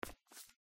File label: Tile step; The Joy of Creation Halloween